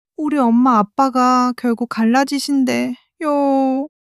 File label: crying